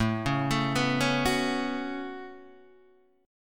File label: A 6th Add 9th A down Guitar DADGAD